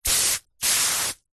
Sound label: household hose water spray heavy pressure onto grass